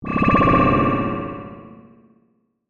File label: Vintage Ray Beam, Scifi, Vintage, Ray, Beam, Large, Cannon, Science, Fiction; Battle